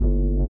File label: LIQUITAR; Spectrasonics; Metamorphosis